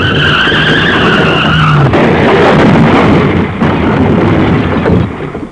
big crash; Museum of Anything Goes

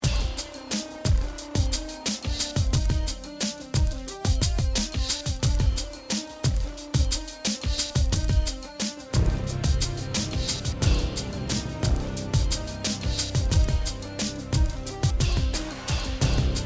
music; National Security Press Kit

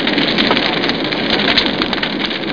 fire

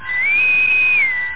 whistle; nettrek

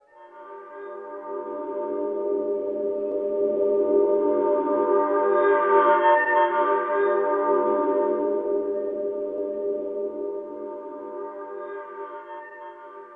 waterphone g5; PC Gamer IT CD 40; SMACDEMO

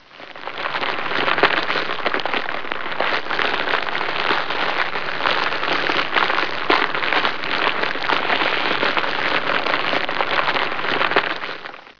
fire; 8bit

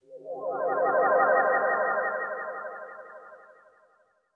open foil; MANALINK; DuelSounds

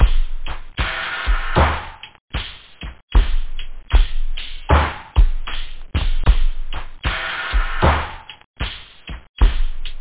slurpp, loop; da capo vol1

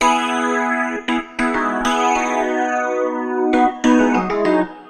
Synt; FUNKACID3

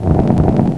moving wall sound; Curse of Dragor

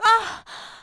Hit

